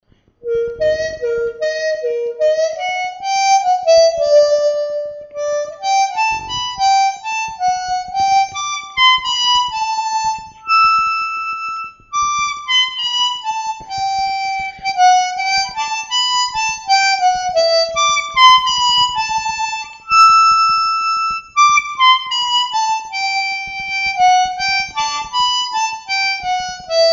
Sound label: harp